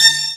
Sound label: Attack Brass; Mo' Phatt; Digital Sound Factory Mo' Phatt